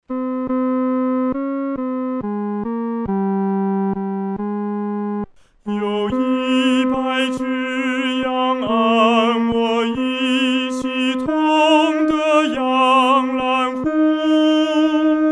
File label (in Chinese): 独唱 第三声